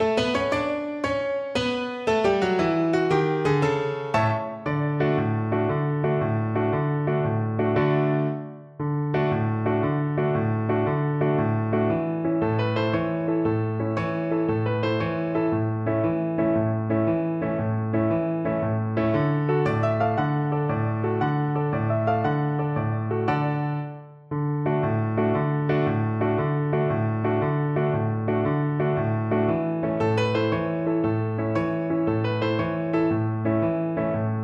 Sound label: luna mezzo mare, Viola